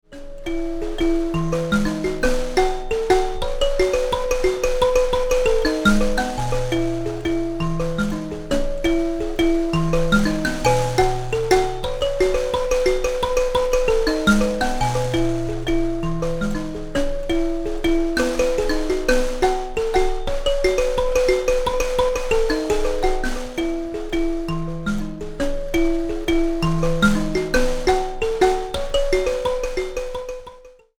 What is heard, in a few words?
mbira